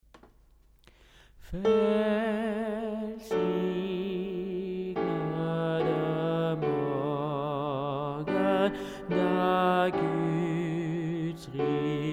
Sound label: basses; velsignede morgen